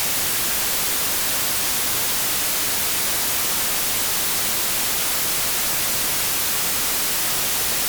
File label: noise